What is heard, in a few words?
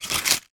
paper cake case put back in stack; foley